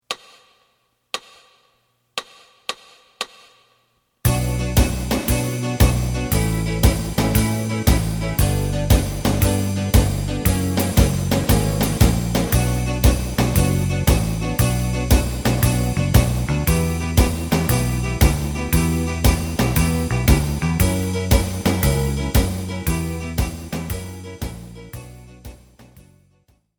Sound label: lead